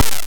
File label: fly death; horizontalrunner